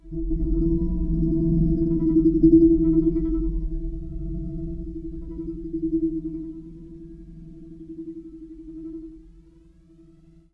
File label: Interference E; Zero-G Seismic Frequencies; 90 s Sample CDs